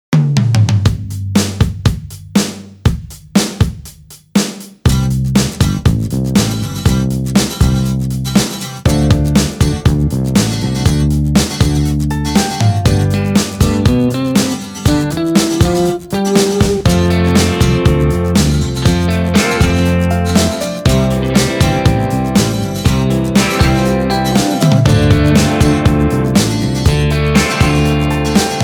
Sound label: tight maple kit